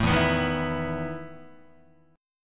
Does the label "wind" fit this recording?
No